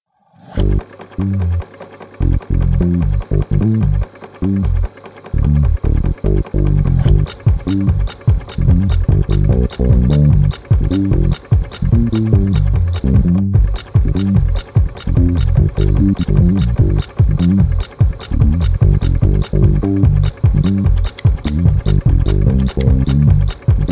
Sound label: sewing music